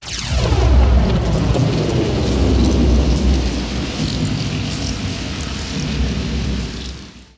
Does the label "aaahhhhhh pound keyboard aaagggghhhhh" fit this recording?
No